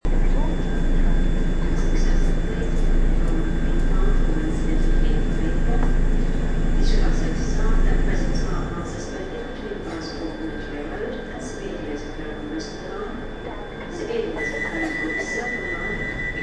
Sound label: london soundscape green park to victoria